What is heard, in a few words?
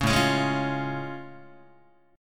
A Augmented Major 7th A, Guitar; strummed; JGuitar; 4,5,4,6,5,4